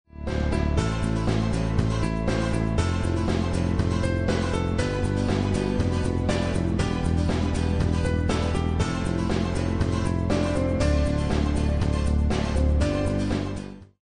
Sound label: lost round; music kits custom